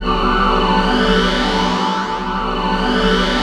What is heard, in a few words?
STRNG SPACE